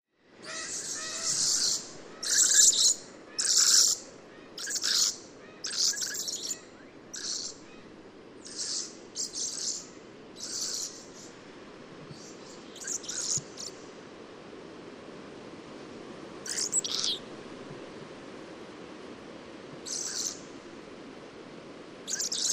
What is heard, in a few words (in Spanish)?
Lori Solitario